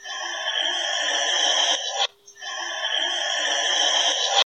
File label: Komodo Dragon